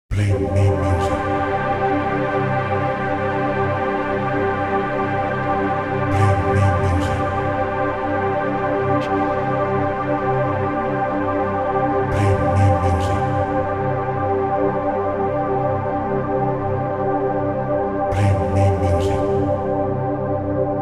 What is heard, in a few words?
Drones; drones collection v1